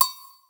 Agogo Bell Hi; Percs

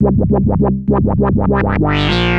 ARP Odyssey, Arp A Line, synth samples; musicradar-synth-samples

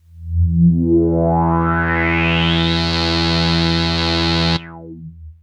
Arp C E2, ARP Odyssey; synth; musicradar-synth-samples

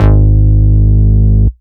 Root G#, Bass; Vengeance Samples

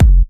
Soft Kicks; Vengeance Samples

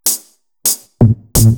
Electro Loop 128BPM; Vengeance Samples

